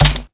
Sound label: rpg reload place rocket